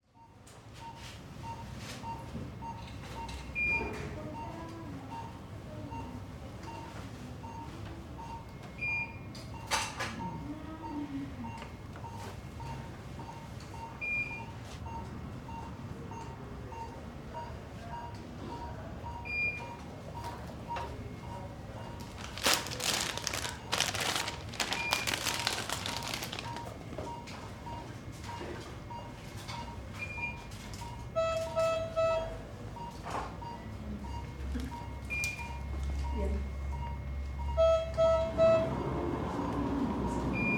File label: Hospital; Nikko Barrera-Amaya; Healthcare